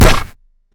Bullet hit stone; Hovl Studio; SFX Battle sounds; WhiteMan Unity2D

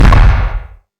Small metal hit explosion; Hovl Studio; SFX Battle sounds; WhiteMan Unity2D

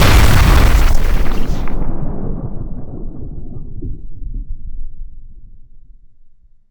Huge explosion; Hovl Studio; SFX Battle sounds; WhiteMan Unity2D

